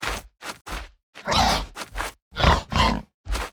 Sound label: emote laugh; sejuani